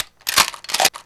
weapon pickup

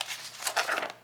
Book Page Turn; SFX Kit; Foley - Misc

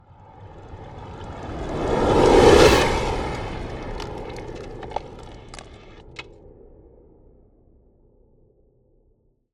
Ice Spell Whoosh, Cold Whooshes; Arctic Aura

